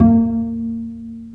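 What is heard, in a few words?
STR Cb Pizzicato, strings; Roland L-CD702; Cb Pizz 1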